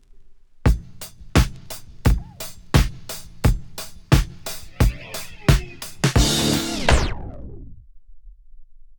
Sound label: long stroke, drum break; I Found A Drum Break Vol 2